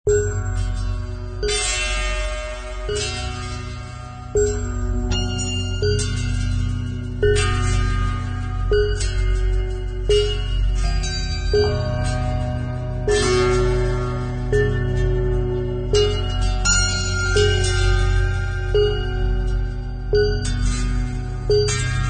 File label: Apocalyptic Musical Tortured String